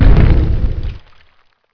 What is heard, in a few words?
step; garg